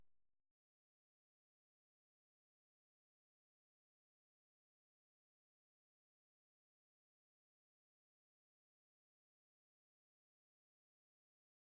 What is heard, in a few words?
114 BPM F# Min Synth; Loop Stems; Cymatics; Sensualism